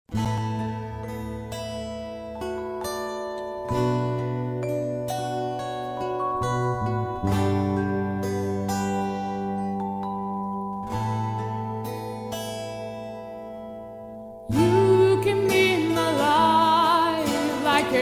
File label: acoustic version